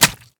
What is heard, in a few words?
Imp Flesh